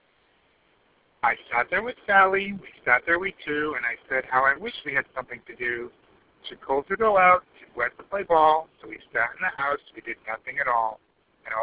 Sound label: highway car; jawbone